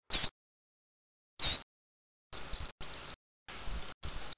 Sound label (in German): maus